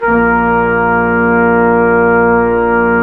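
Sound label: BRS QUINT, brass quintet; Roland L-CDX-03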